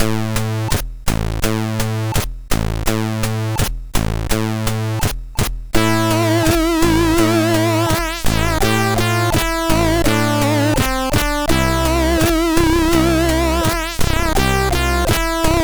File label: MOS6581R4 sid